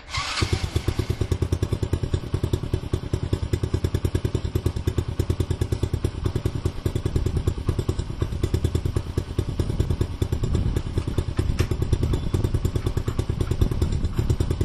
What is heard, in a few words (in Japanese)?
モナカ サイレンサー有り